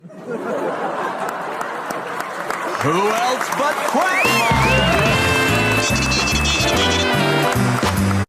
applause

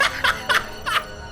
laugh track; Snapcube Sonic Adventure

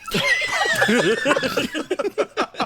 laugh track

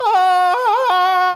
yelling

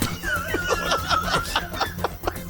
laugh track

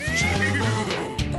laugh

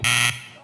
buzzer

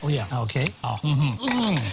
noises

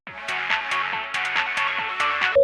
ringtone

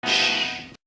shhhhh

